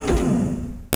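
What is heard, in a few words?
door door2 open; 星际争霸音效-misc-door-door1opn; 星际争霸其他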